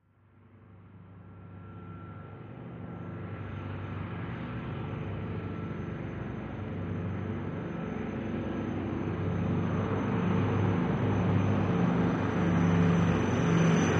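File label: Prop Airplane Landing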